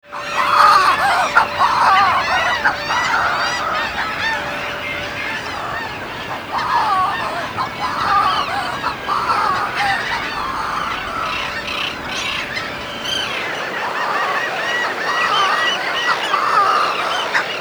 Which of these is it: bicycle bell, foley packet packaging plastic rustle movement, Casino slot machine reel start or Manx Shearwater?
Manx Shearwater